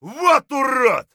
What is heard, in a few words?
ffire; Escape from Tarkov